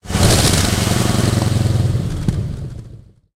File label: sherman m4a1 engine load, tanks; War Thunder